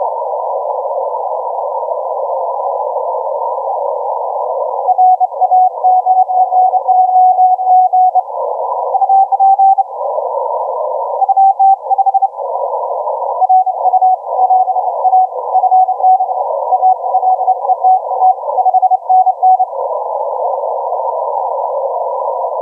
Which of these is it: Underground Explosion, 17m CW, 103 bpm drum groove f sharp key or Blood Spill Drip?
17m CW